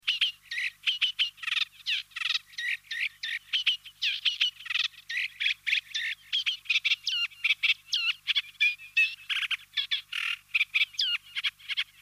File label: Reed Warbler Acrocephalus scirpaceus